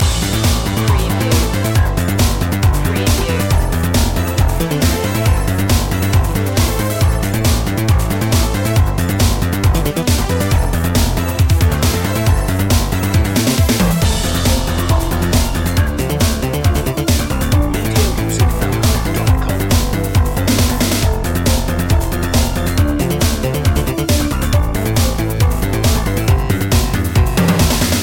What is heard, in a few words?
retro techno assault loop